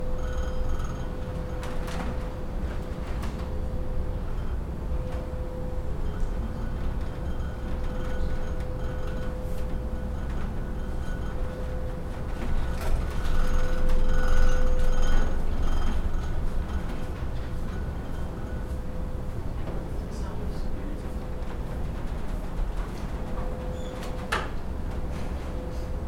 QM2 Chandelier Rattle; Chandelier Rattling; Miscellaneous Sounds and Silences

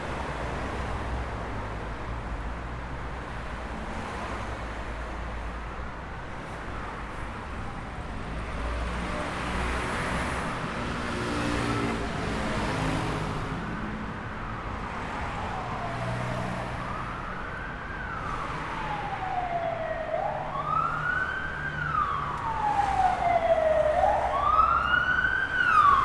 Lisbon Walk, sirens

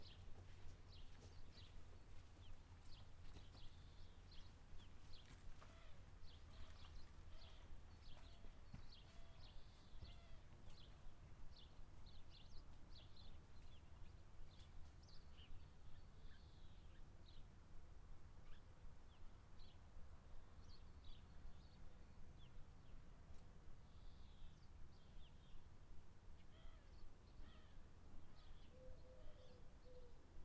Lower Austria Bird Calls; natural sounds

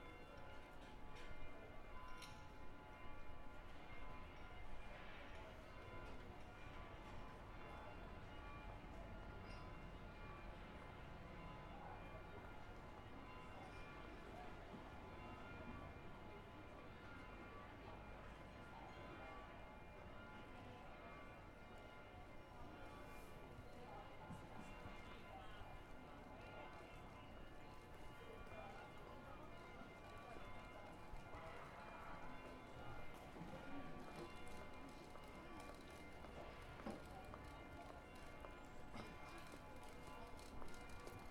Florence Church Bells; Religious Sounds

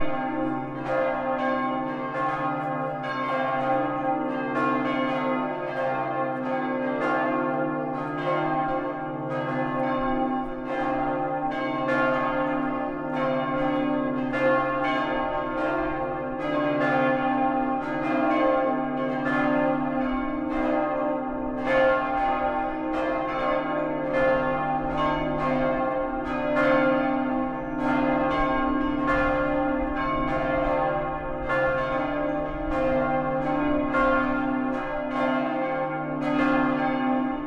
Avignon Bell Cacaphony, church bells; Religious Sounds

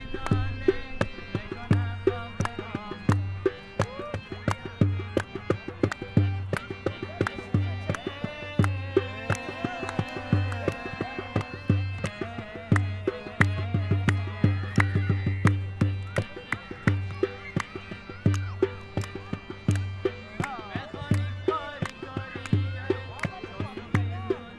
Vienna Arab Music in the Park

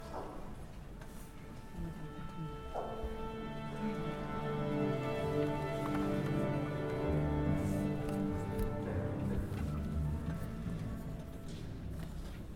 NYC The Messiah Tuning, musical sounds; Tuning Before the Messiah

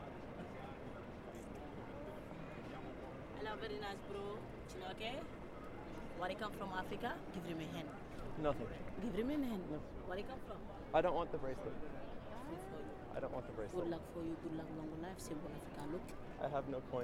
Vatican Square with Elephant Bracelet; linguistic sounds; Elephant Bracelet Salesperson

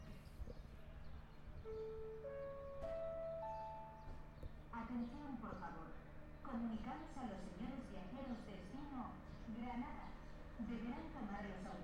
Antequera Train Station, travel sounds; Train Station Announcements

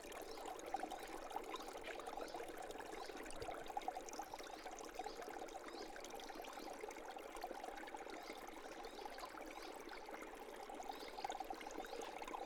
Mongolia Gobi Ice Valley Water, natural sounds; Mongolia Tour Gobi Ice Valley; Stream

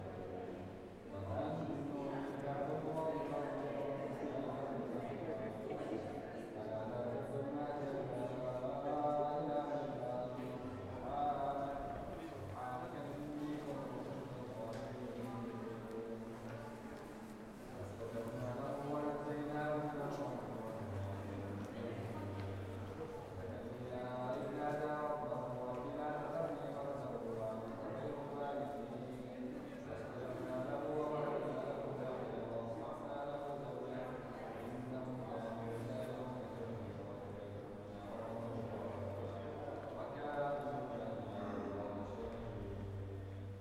Kazan Chanted Prayer in Mosque; Reciting Verses in a Mosque; Religious Sounds

